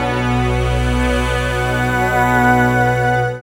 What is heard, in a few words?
SYN, DANCE SYNTH